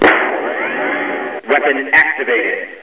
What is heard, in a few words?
blast activate, Lazer Tag